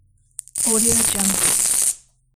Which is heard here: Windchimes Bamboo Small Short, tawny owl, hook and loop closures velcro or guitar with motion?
hook and loop closures velcro